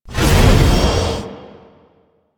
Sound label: Hammer Throw Power Up; Warframe; Veilbreaker; Sprag